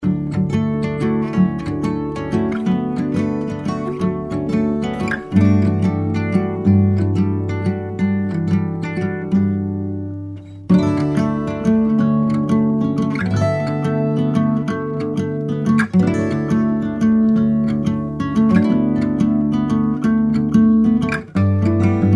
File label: Indie Guitars